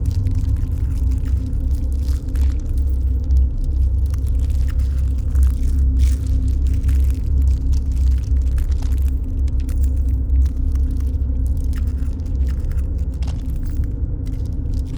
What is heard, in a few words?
pro ship mining station idle; biogenesis